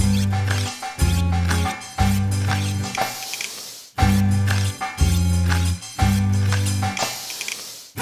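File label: food world music inferno suspense no vox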